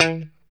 POP E 3, STRAT guitar

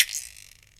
GUILO; 90 sSampleCDs; Crypton - DrumBase5000; DANCE3+TB303

